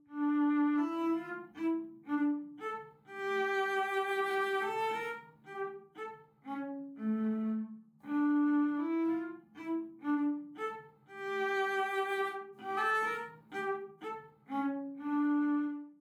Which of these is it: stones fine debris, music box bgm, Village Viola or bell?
Village Viola